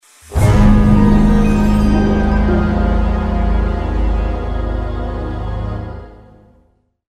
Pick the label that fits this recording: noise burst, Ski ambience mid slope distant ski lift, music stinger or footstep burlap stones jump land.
music stinger